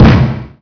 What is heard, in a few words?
Sandbag Hit; Playable Tutorial Level; TR2